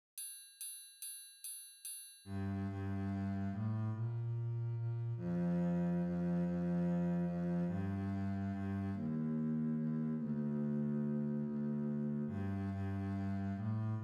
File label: viola bass duet in Bb MIDI clicktrack bass only; What Child Is This